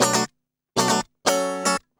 GUI 120 BPM

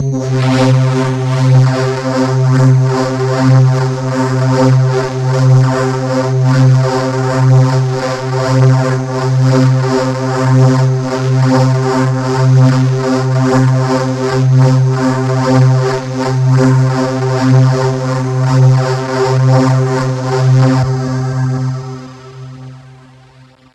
bass reese c; M8; M8JUNGLEPAK1

